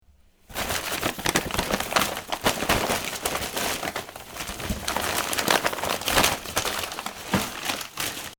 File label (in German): wuehlen